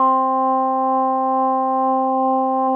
ORGAN, ROLAND MKS-80; Rubber Chicken Software Sample Bank

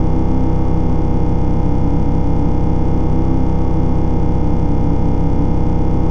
Drone Static; Drums & Drones

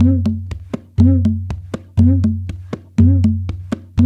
Haitian Congo, World Dance

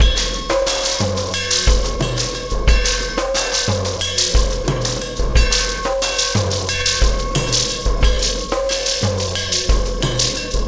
Oscillation Beat

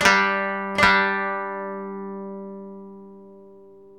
DRONE, Koto

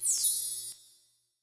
Bell tree, Percussion; realdrum

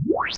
ZAP REVERSE

